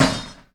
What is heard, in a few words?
IMPACT Metal Medium Rattle Short; stereo; IMPACTS; Universal Sound FX